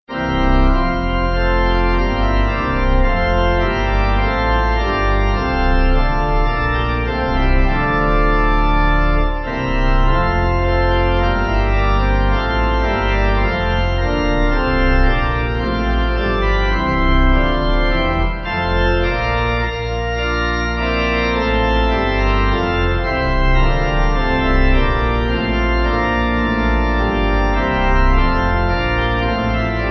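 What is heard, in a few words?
Pipe organ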